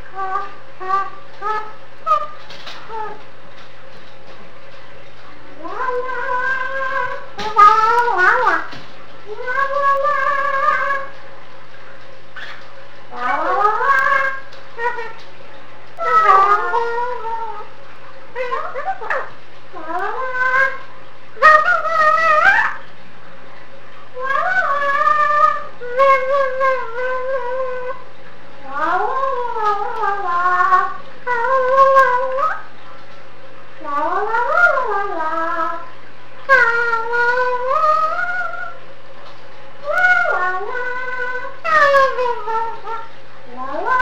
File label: lalala